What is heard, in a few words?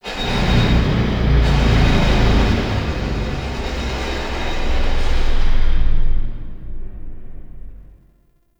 Vault Heart Beat Broken Creak; Ambience; Warframe; Infested Eris